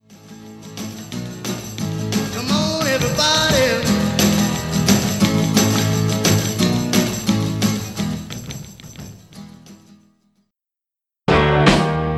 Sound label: rocknroll